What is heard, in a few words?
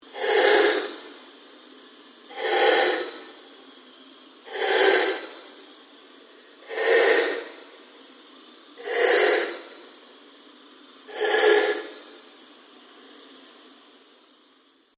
Ambient Drone Slow Sweep; Sound effects created by manipulating other sounds; Drones (Sound Effects:Sound Design)